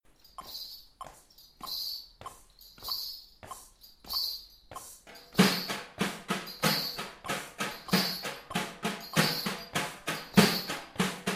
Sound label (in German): paradiddle besen, rhythmik; mit Jazzbesen eingespielt und Schellen am Fuß, um die Eins des Taktes zu markieren